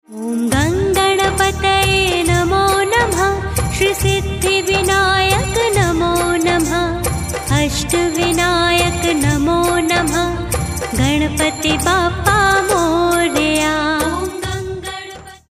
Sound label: GANESH MANTRA